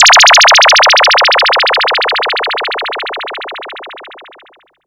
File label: System Sweeps; Sonic Foundry (Sony Creative Software); Underground UK House & Electro